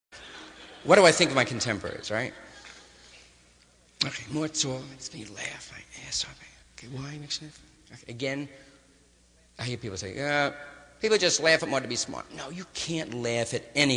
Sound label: fake laugh